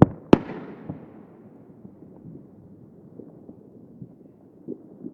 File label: firework; best service Blue Box